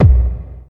Reverb Kick Sample B Key, Royality free kick drum sound tuned to the B note.; kick drum samples